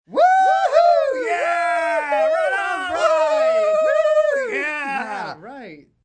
Group Cheer 2 Guys, Group cheering, small group, (2-5 people), sports (human voice); Crowds; Voice Box